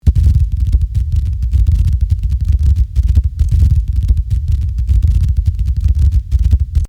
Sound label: weird